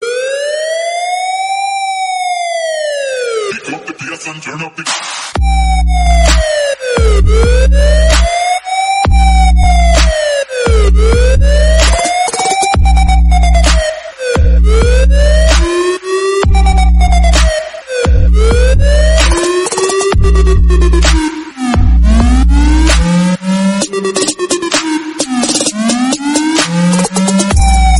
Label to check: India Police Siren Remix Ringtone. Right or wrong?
Right